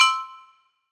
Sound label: win line sound; Hungry Shark; Wazdan